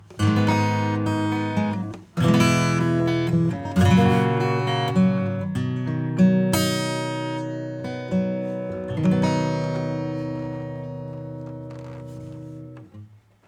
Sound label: Acoustic Preamp